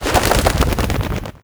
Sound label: pigeon wings takeoff; critters